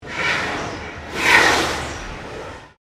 Flame Gas Giant Burst Double, Giant industrial gas flame jet; Flames (Sound Effects:Objects)